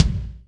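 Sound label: bass drum, Real Kit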